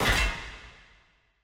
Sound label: smelter furniture tools